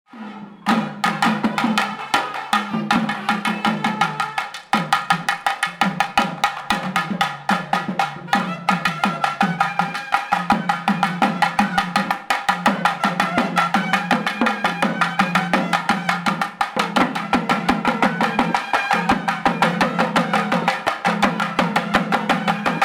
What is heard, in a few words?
percussion